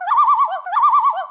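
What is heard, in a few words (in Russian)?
двойное стрекотание полярной птицы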